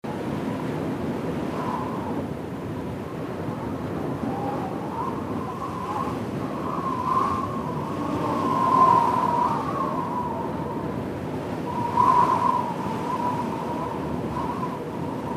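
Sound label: WIND Breeze High Altitude loop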